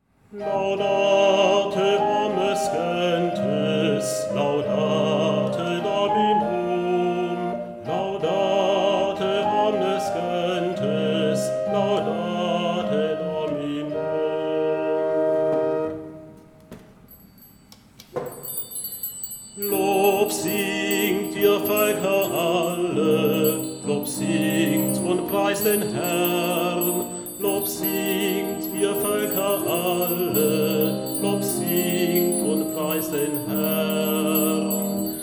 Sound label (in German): singt mit Orgel; traversfloetenorgel